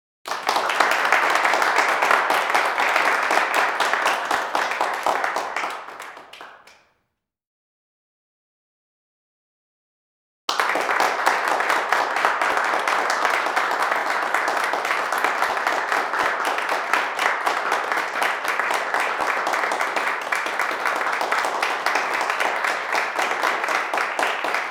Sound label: Applause Indoor Small Crowd In Large Room, Medium